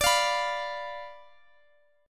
D Minor 6th Guitar Open D

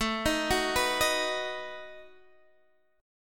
B 7th A

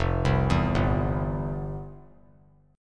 F Major 7th Suspended 2nd, F, down, guitar; arpeggiated; JGuitar

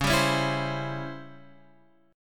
Db Minor Major 7th Flat 5th Db down; DbmM7b5 strummed; JGuitar

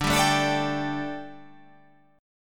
D Suspended 4th D

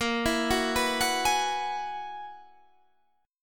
Gb 11th Bb

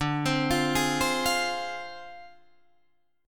D Minor 7th Flat 5th Guitar Drop D

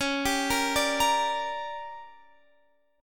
D Major 7th Suspended 4th Sharp 5th, chord

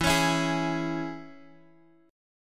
E 6th, down, Guitar, Drop D

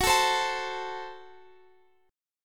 Gb Diminished, down, Guitar, Open F

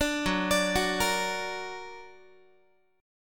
Gb Augmented Gb Guitar; Gb+ arpeggiated

